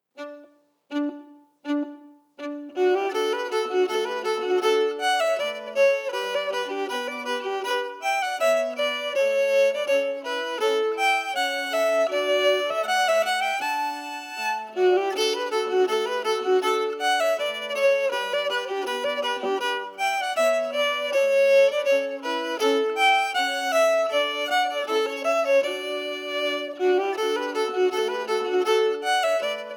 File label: melody emphasized; Gardebylaten